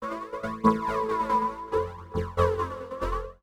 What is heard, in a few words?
TEKNO 140; Techno2